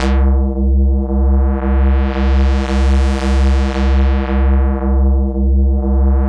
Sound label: BASS C3 L, Jungle; JGL BASS; USB Soundscan vol.05; Explosive Jungle; AKAI; 90s sample CD